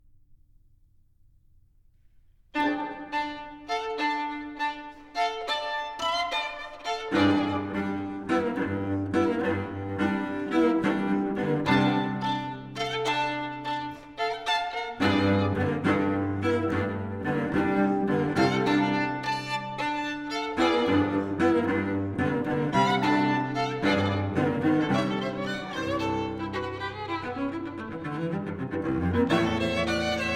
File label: double concerto for violin violoncello and orchestra tempo giust